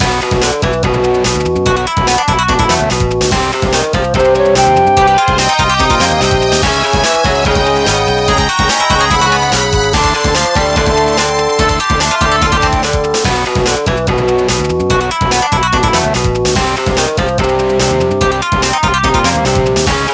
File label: Shamisen Fury Showdown